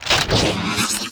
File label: Pickup Scifi; SFX Kit